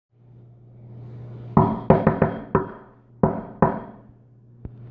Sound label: Knock Shave Haircut